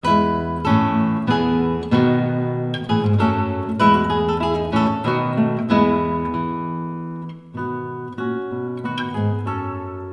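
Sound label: ensemble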